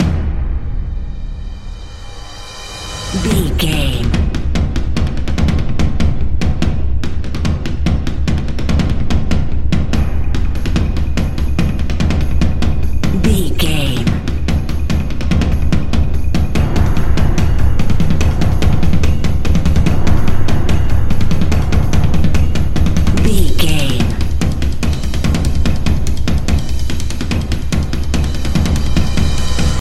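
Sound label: fierce tribal drums loop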